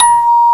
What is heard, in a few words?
MUSIC BOX, BELLS; FAIRLIGHT CMI IIX